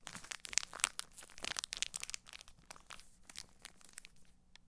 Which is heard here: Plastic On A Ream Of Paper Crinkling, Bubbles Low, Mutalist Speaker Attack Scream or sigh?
Plastic On A Ream Of Paper Crinkling